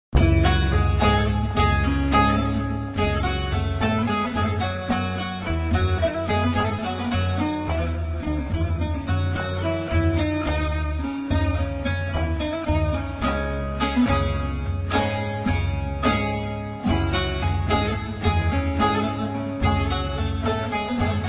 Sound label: Saz